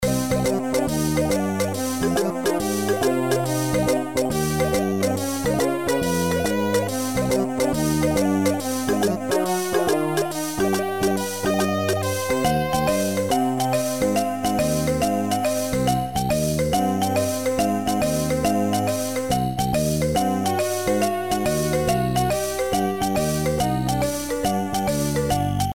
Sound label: Ghost Ship Shuffle (Amiga); monkey island 1 the secret of monkey island